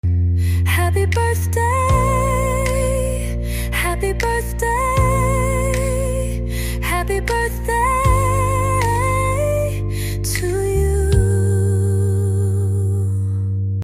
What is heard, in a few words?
birthday used voice